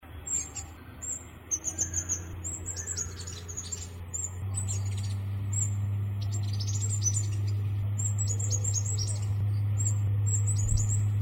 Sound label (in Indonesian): burung madu hitam